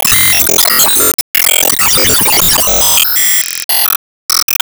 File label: Sys Grade Max